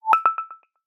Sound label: carme; Android-планшета Google Pixel C; рингтоны, мелодии будильника и уведомления; Звуки Android-планшета Google Pixel C